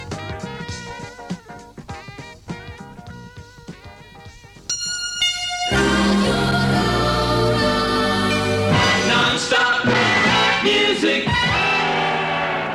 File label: jingle radio nonstop music; raurach